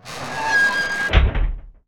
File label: Door Metal Bunker Close; Doors